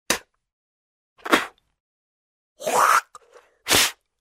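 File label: human spitting sounds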